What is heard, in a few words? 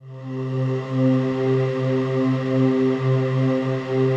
PAD, Pads; Best Service; XX Large